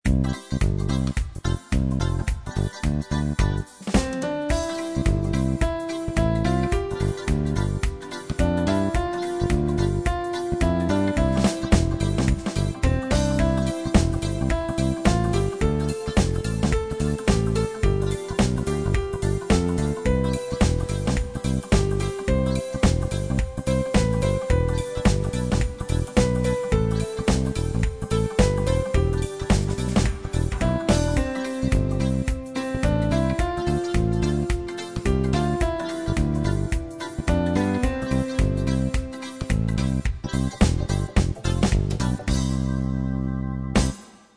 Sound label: reggae